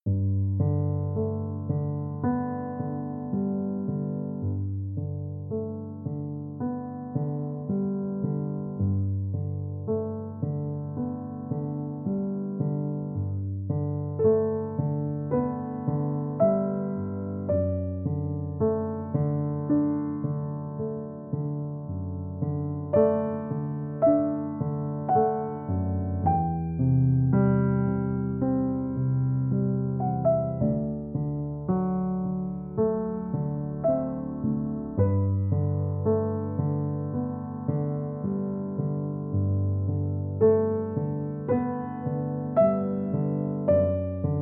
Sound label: Calm and Peaceful Piano